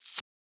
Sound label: Shaker; FLPRO